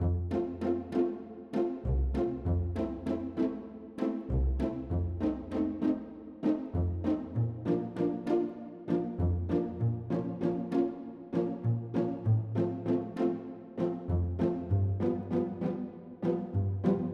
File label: training horn; berni